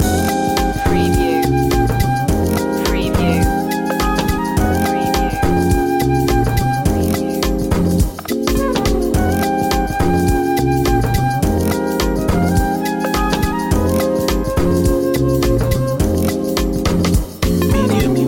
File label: flute fantasy loop